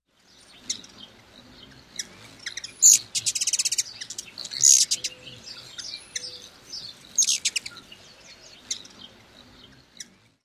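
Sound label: Black-chinned Hummingbird chase calls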